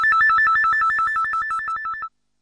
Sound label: oscillating flyby; Alarm Sounds